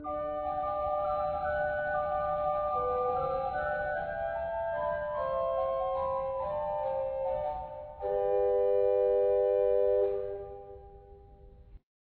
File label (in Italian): flauto